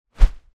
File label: Swhoosh; Gameplay